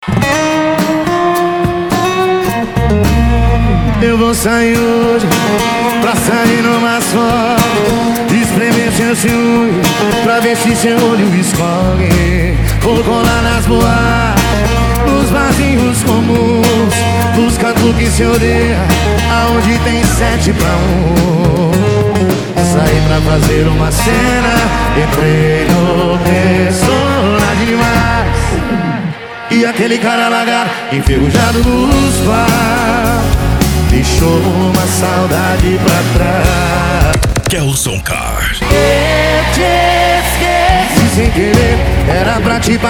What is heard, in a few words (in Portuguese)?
sertanejo e lambadao